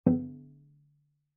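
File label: Cello pizz fortissimo sul C B2; MIS Pitches - 2014; Strings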